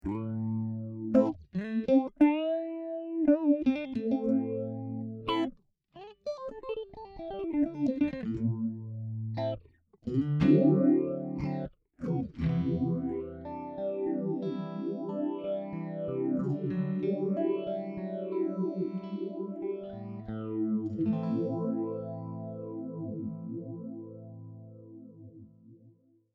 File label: Phaser, guitar